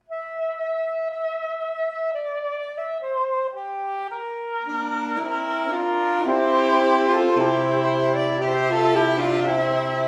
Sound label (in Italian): Piva Siciliana